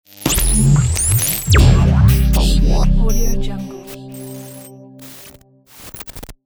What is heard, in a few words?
glitch logo